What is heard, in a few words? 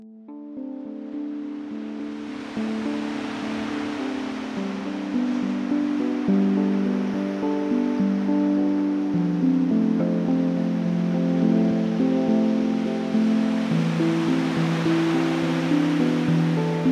instr